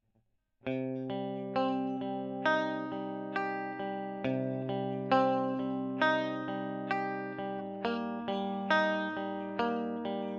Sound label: E.GTR Gefell